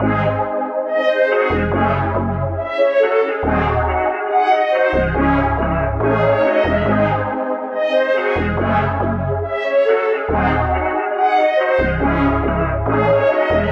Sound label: 140 BPM C# Min, ARP2600 OB6 P6, Hip Hop Melodies, Analog; Kaleidoscope